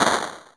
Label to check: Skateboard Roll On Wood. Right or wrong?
Wrong